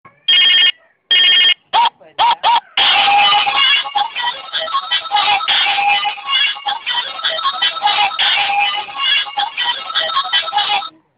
Toy Phone Tone

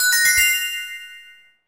Puzzle Success

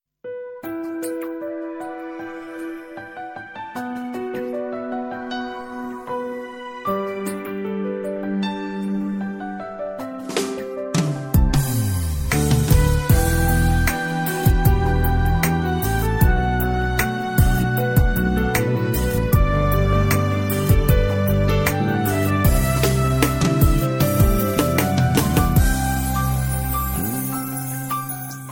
Piano in Love

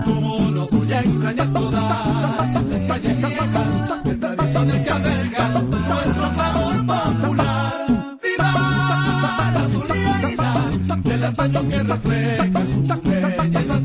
Vocals

